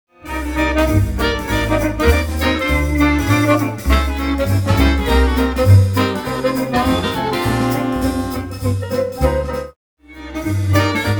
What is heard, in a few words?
b sax